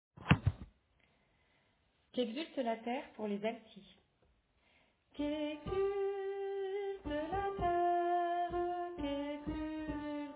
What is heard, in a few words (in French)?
Alto